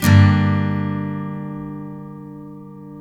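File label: steel G minor up, CHORDS STEEL, Guitars; Spectrasonics; Hans Zimmer Guitars VOL-1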